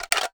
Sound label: m14 mag out empty, weapons